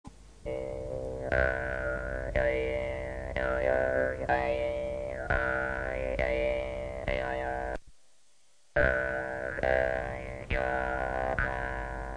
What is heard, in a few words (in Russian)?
vargan syberian; Tuva Mongolia